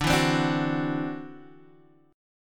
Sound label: E 7th Suspended 2nd Sharp 5th D, chord